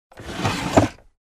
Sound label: Opening up sliding door